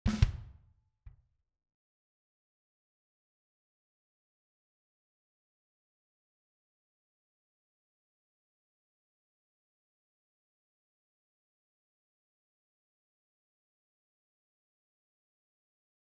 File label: drum; JASS Session